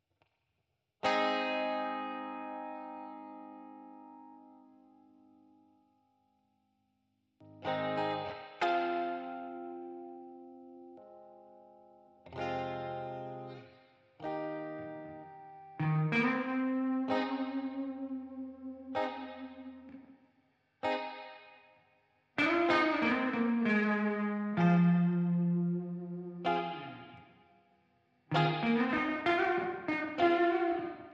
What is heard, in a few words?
Guitar Clean